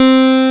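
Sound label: analog electric piano C4, analog synth; AKAI S6000 CD-ROM - Volume 1; SAMPLE WAVE MIXING 1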